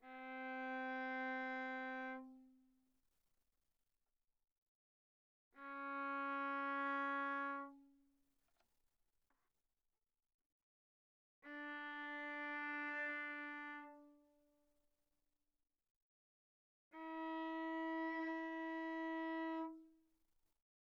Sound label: Viola arco sul C mf C4 B4